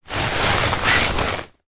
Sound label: household movements on bed with mattress protector on